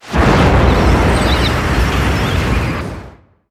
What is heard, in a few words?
ocular rift portal